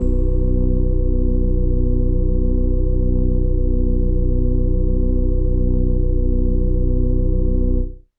Organ B1; Kawaii

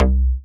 BASS

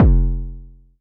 KICK F; Hunkox

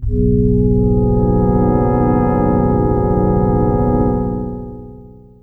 PAD; Dreamboatz

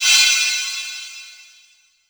Crash Cymbal

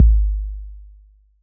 BD 808 Decay

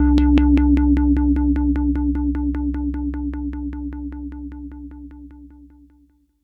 SYNTH Beauty Bellio Lower

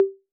Conga Hi A 808; Clean; Digital

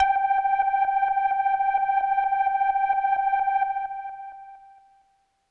Leslie Kawaii G4, organ

